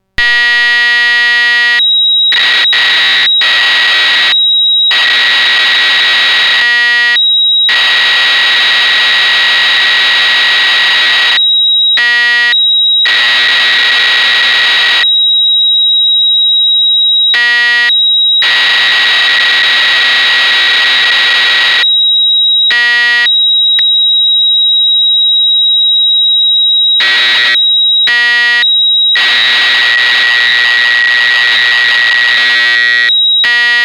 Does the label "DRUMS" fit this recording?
Yes